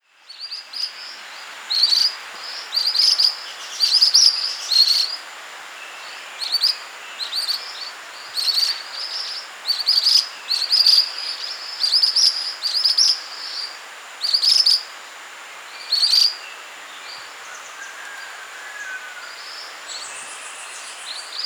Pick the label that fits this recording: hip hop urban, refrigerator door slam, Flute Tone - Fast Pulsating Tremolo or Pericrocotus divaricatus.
Pericrocotus divaricatus